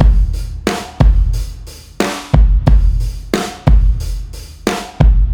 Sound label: Simple Drums; Ударные и барабаны; Electric Jazz; 130 BPM; loop; Simple Drums B (Электро Джаз)